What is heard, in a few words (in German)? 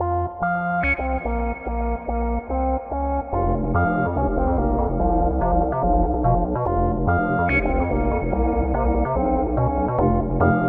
Loop Song